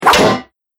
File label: Hand Axe Hits Armor